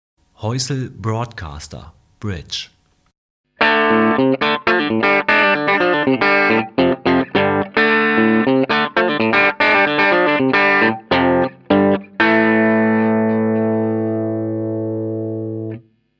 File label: Häussel Alnico 3 Broadcaster Bridge PU, Tele